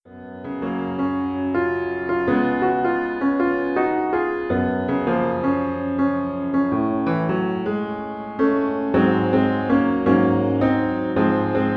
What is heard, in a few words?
advanced piano solo